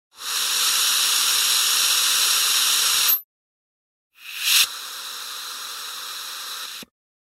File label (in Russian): vyhod vozduh cherez klapan